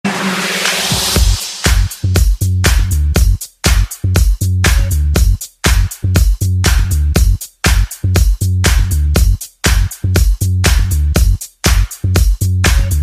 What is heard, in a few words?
iphone 8 mix ringtone